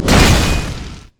twinbite human; LoL; shyvana

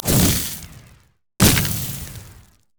incinerate; LoL; annie base

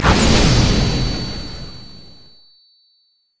stun; Vel'Koz

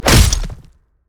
basic attack knee; rengar

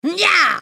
spell cast effort; Heimerdinger

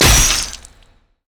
shackle hook; LoL; thresh

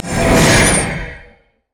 shackle dash; LoL; thresh

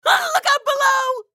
ultimate effort; Ziggs; en US

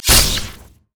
manta strike; LoL; fizz skin04

